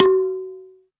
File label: Percussion, House